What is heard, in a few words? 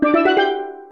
completed; hexa blocks